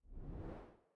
zoom In

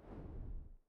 zoom out

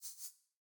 hover Expand

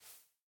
terminal in

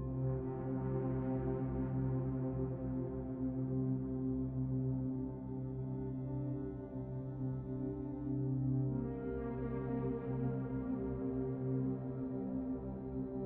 music menu

